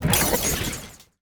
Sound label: Arcade Single, Sci-Fi Interface; Sonic Bat; Sci-Fi Interface Construction Kit Vol. I